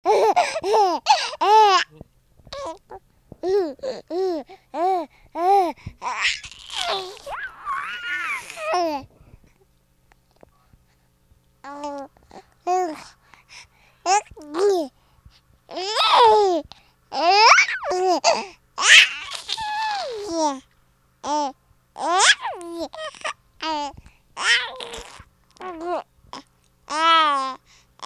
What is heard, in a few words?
laugh